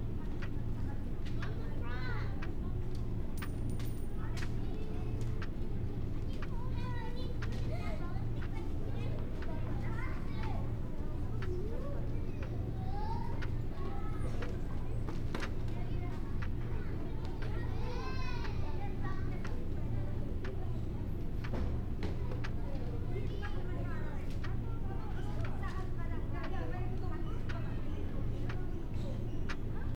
indoor classroom kids chatter; LOOP; anemoi

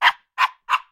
dog voice; anemoi

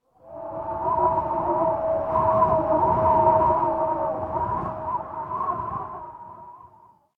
clear wind; anemoi

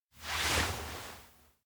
point; chiharu; anemoi

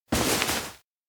pillow take show; anemoi

